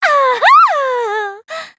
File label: ahah